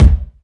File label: low tone kickdrum sound b key, Royality free kick drum sound tuned to the B note; kick drum samples